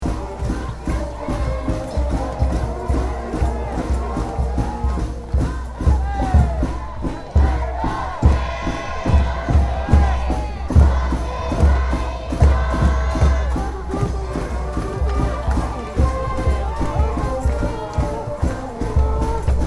Ambience Sannohe School Baseball Game, Weekend interschool baseball game in Japan; Sports Sound Effects; Foundation1